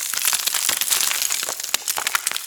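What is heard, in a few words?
small rocks fall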